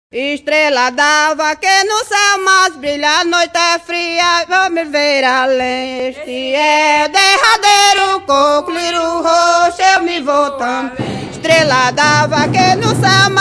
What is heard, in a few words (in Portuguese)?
cocos